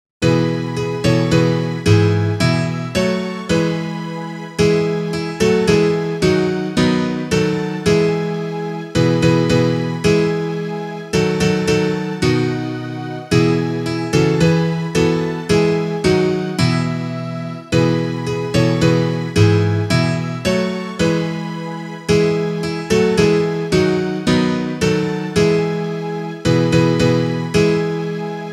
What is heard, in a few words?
instrumental